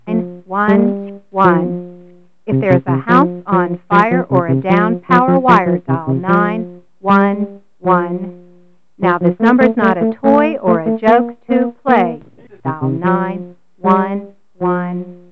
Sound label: jingle